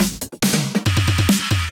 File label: Percussion Fill